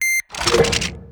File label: rotate